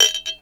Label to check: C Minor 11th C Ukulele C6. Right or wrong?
Wrong